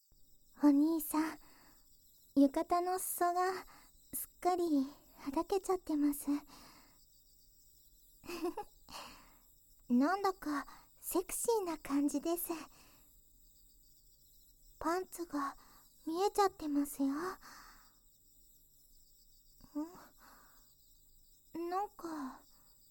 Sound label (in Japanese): 手コキ 耳舐め, ASMR; 夏恋その参